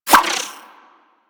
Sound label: Pet Skip Rope Whoosh; Warframe; Moa; Tenacious